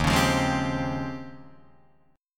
D sharp 13th, Guitar, Drop D

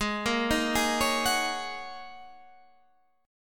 Ab 7th Suspended 2nd Suspended 4th

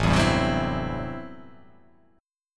A Minor 13th A, Chord; Am13 strummed; JGuitar

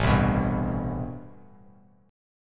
A-Minor 9th A Bass Standard; strummed; JGuitar

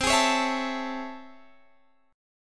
A Major 7th C; strummed; JGuitar

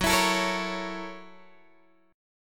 Gb 7th Sharp 9th Gb down Guitar Open G

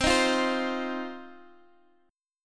C Minor Double Flat 5th C down Guitar Open D

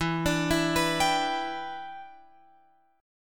E Minor 7th, down, Guitar, Open F; Em7; arpeggiated

